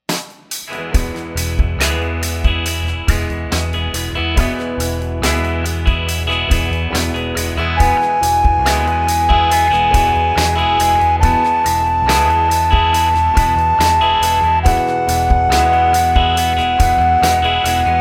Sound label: scale degree